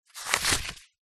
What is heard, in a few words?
household book small page turn; zapsplat